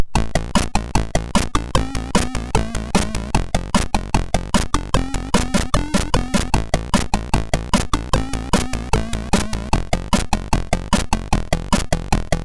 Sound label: sid, MOS6581R2